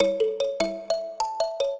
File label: trio, mbira; Mozart